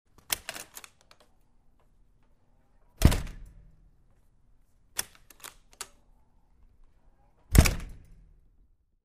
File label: Metal door open, close